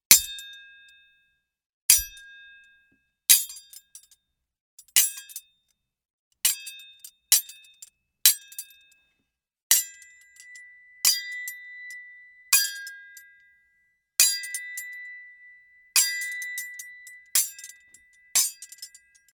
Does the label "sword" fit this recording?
Yes